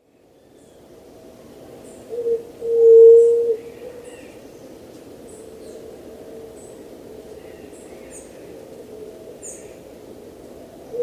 juriti pupu

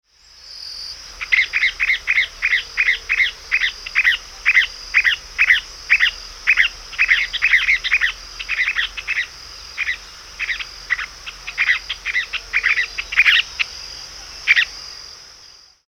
lavadeira mascarada

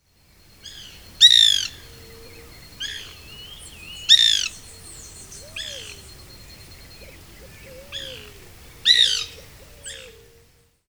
pica pau branco

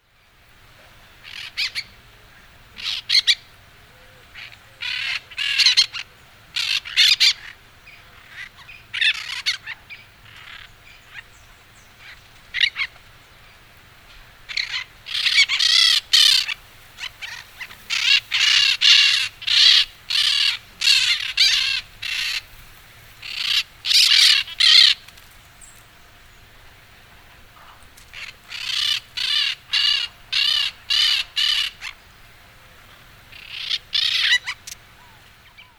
periquito rei

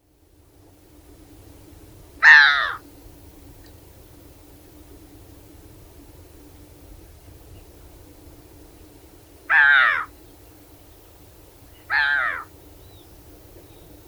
gaivota de cabeca cinza

